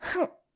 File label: hmph